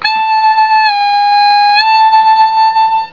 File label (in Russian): 6 draw, бенд, губных гармошках; Пример бенда 6-вдох